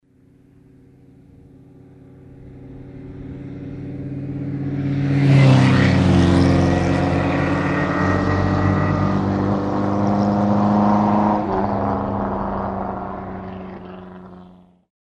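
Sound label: Two sports cars passing by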